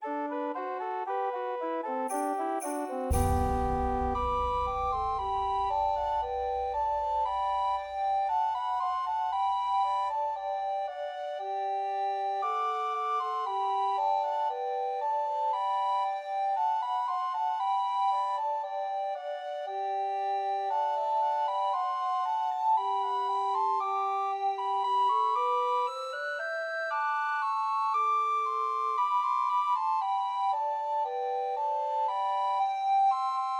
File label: Deck the Halls, Christmas Trios for Two Descant and One Treble Recorder